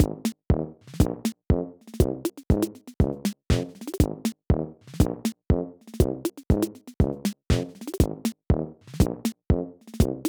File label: rhythm